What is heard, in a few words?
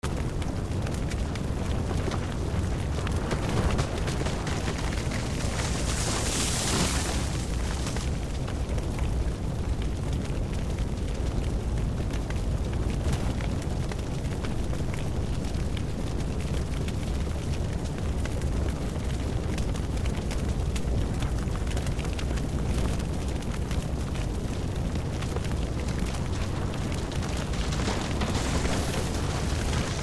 fire heavy with creaks